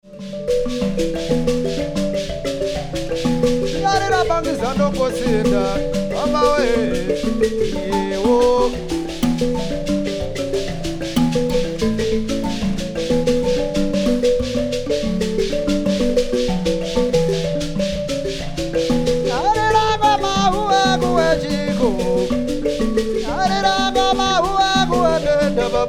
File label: mbira